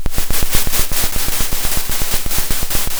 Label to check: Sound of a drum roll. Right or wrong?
Right